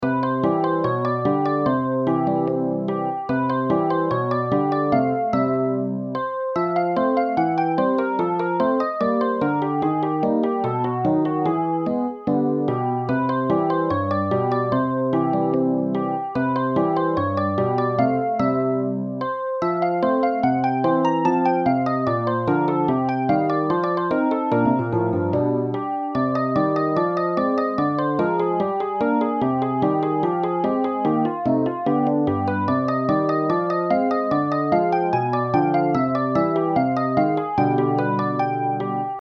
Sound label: piano one